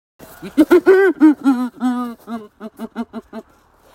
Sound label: Hyena